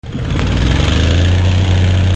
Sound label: Tank, Machines